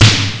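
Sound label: GMC snare drum; Amiga Soundtracker Sample Packs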